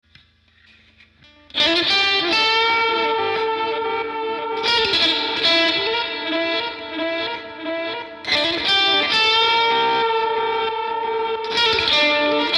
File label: Reversal of Fortune Blues Guitar; Synthetic Zen; Bits & Pieces 2014